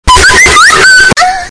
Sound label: cool musik